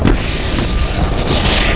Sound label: SKATE ICE; TRANSPOR